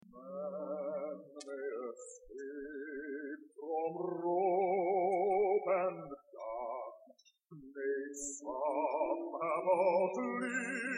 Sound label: air